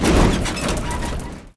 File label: Land Hard; Test Drive Off-Road 3